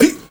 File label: HEY HEY, MALE NOISE, Heart of Africa; Spectrasonics; Heart of Africa 1